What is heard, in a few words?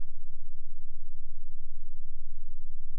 sweep; 7-14 sweep; 7-14 range test